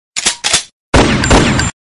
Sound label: gun sound notification; sten gun; pubg